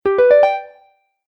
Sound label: Game Bonus Ascending Strum; Video Game Sound Effects